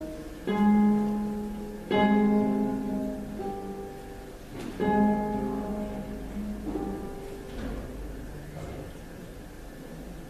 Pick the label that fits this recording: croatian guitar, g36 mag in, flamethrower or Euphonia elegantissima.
croatian guitar